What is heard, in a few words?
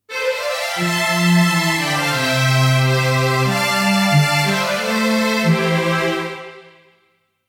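strings legato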